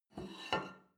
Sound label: plate take table slide, gastronomie; soundpool